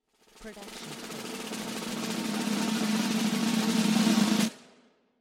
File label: drum roll snare; SoundsCrate